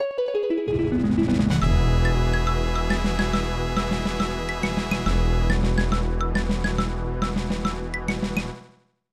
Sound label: Knights' Fanfare; Cacoma Knight; SNES